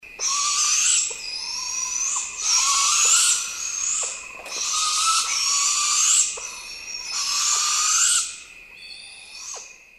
Owl Barred Baby

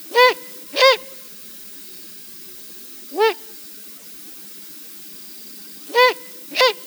owl parents

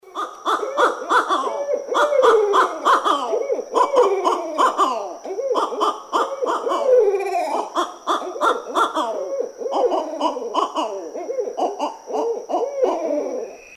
Owl Barred Monkey

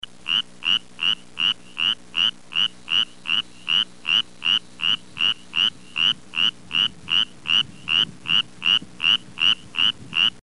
spotted chorus frog

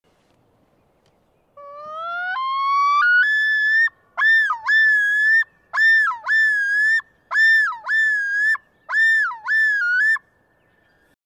loon

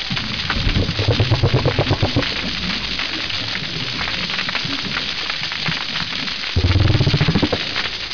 croaks

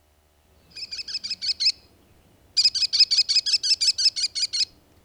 american kestrel

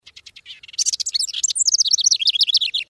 House Wren

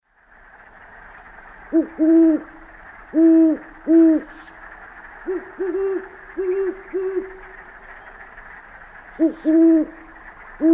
Owl Great Horned Duet

